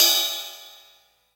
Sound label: Ride DMX; Drum Machines; Drum Hits